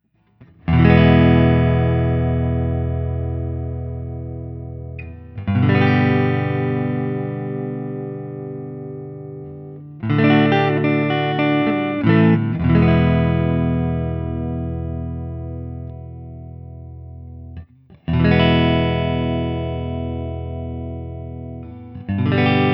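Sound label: Open, guitar; Guild Newark St X175 Manhattan Special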